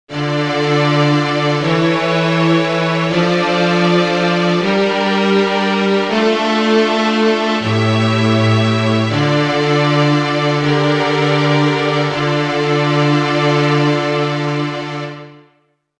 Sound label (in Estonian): jargnevus d moll